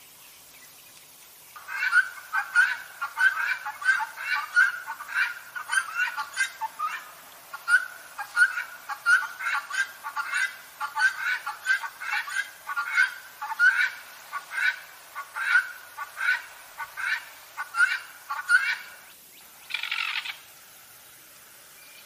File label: Guinea fowl call sounds